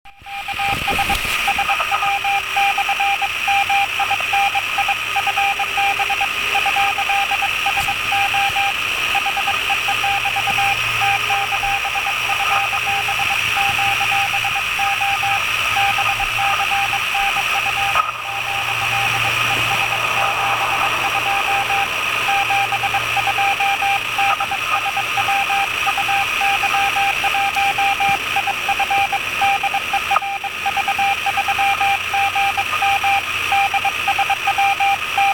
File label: RX